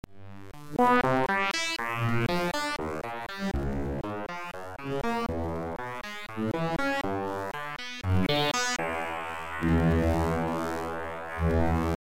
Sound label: fm resonator midi